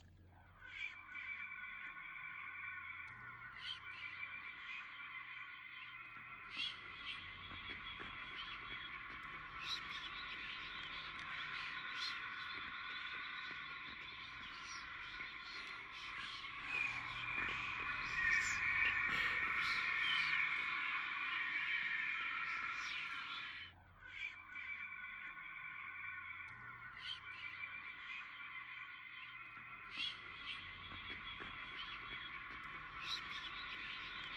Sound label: swamp whispers; Oak; animal filters; New Play “Oak” by Terry Guest, directed by Mikael Burke